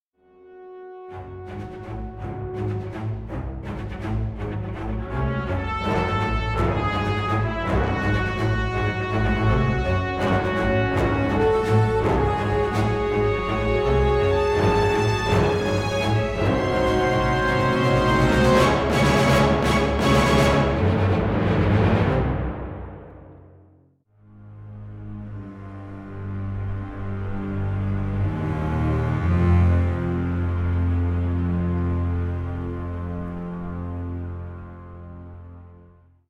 blowing up bridge music; Enemy Front

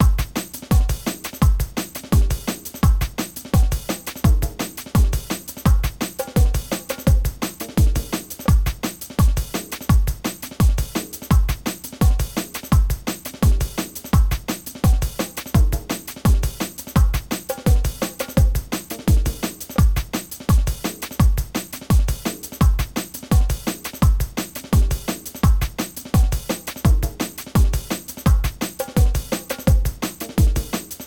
Music Intro Match

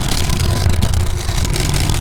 Move Rocks Position

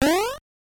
Jump; nuts milk; nes

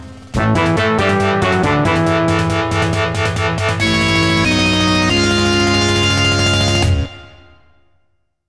Stage Cleared; BRAVE

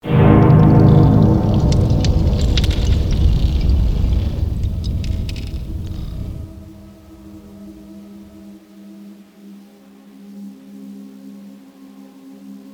sfx puzzlepiece; Among the Sleep

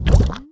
Jam; candy crush jelly saga gamerip

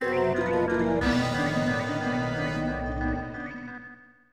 Atlantis Realm Fanfare; Glover; Nintendo 64

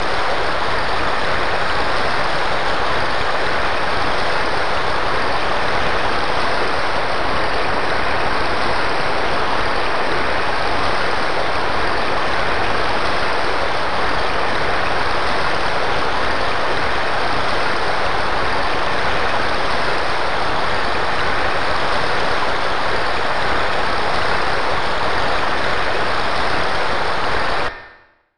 Rain

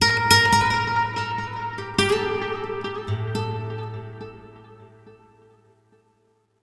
Oneshot Acoustic Guitar; zombie army 4 dead war; ps4 stadia switch windows xbox one

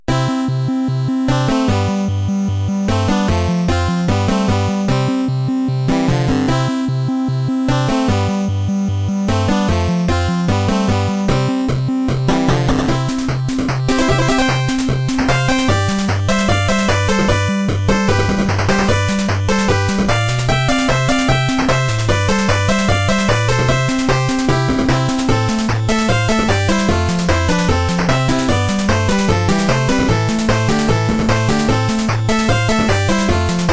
End Game Music; Foxyland

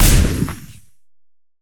Sci-Fi Weapons-Assault Rifle-Single Shot

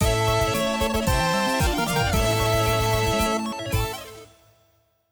Victory!; khimera destroy all monster girls soundtrack 2016

